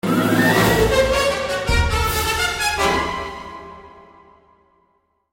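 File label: Music Player V Creature C Death; Star Wars Galaxies An Empire Divided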